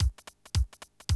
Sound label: Classic DrumLoops